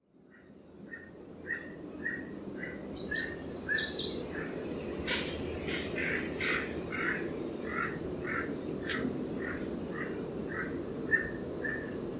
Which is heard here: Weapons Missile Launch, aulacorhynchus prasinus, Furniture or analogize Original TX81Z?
aulacorhynchus prasinus